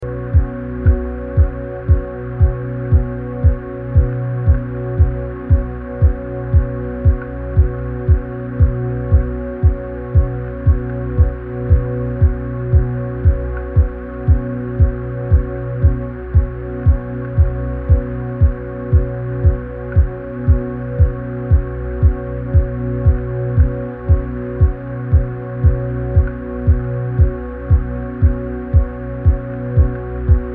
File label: low howl dusk